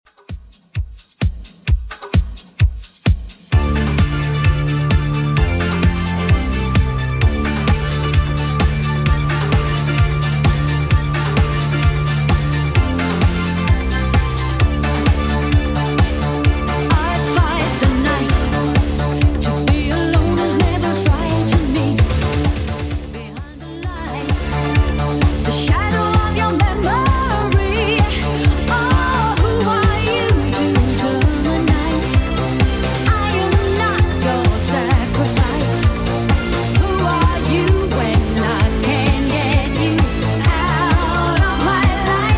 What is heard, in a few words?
80s Redrum 130 bpm